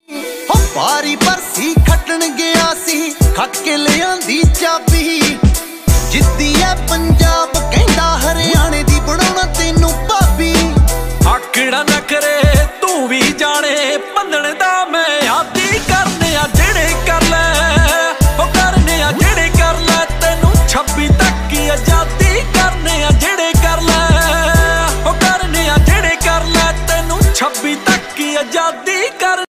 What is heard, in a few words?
Desi Punjabi Ringtone